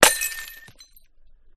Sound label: Glass Bottle Break Smash Long Shrill Ringing; Skyes Audio